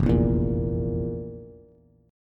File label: F Major 7th Suspended 4th F, Bass; FM7sus4 strummed; JGuitar